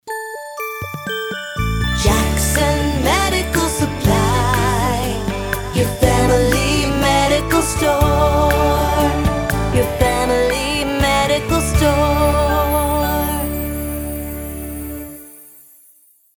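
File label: medical supply jingles, acapella jingles; Jackson Medical Supply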